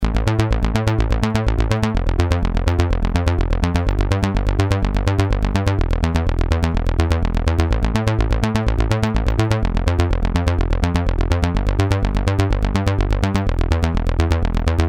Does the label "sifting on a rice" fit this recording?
No